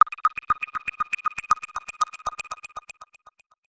Digital Text Data Drop, stinger

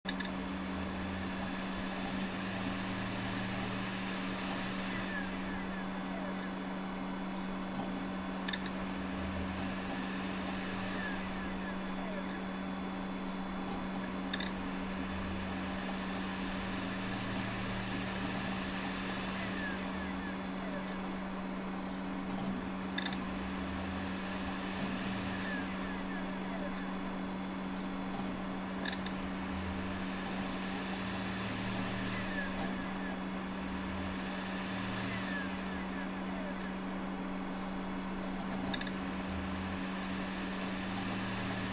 Computer Cd Rom Load

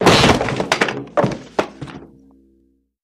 Rude Boing Collaps; boings

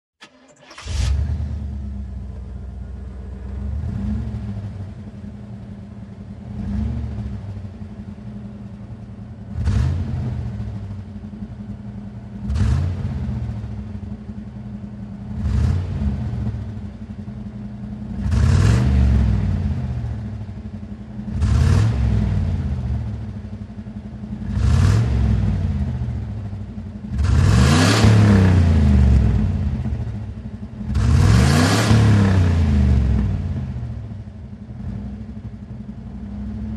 90 Camaro On Rev Off

